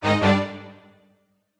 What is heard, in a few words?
Battle Strike